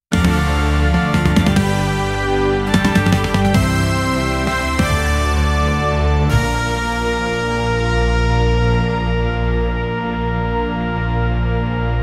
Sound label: music end credit horror mov